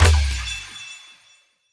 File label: Hero Death FX - GMaj9 Variation; Video Game; Cymatics; Video Game Sample Pack Vol 1